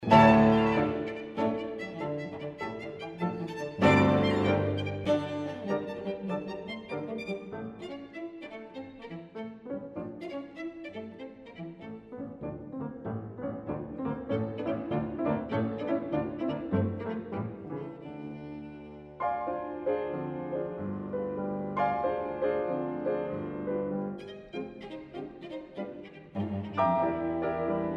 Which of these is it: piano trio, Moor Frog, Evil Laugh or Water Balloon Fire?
piano trio